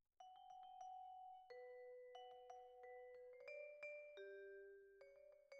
Vibra, Twin F

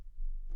Bass Bot

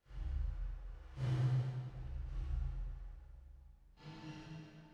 Rev

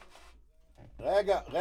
Kit

